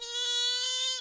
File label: fox; Animals